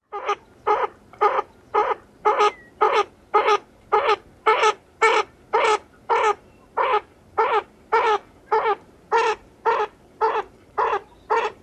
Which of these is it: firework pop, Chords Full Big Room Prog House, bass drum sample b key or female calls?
female calls